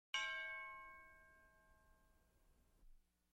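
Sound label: sound of gong strike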